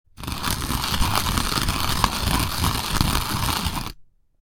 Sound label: Grind the coffee beans